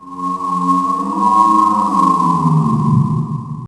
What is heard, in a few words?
Zombie; horrorgifs